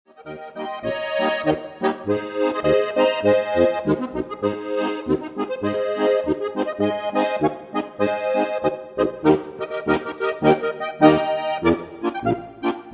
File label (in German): marsch; Harmonikastückl aus Bayern